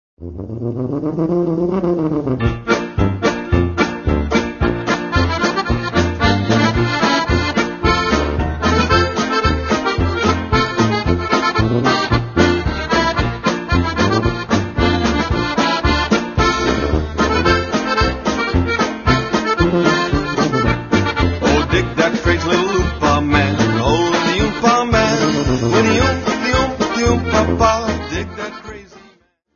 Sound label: Oompah